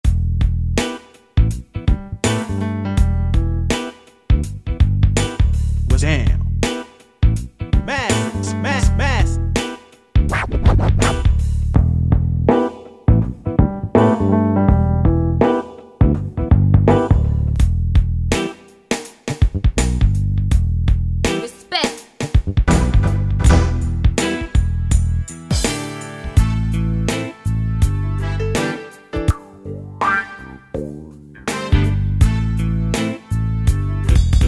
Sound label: Funky Hip Hop, Yamaha DJX-IIB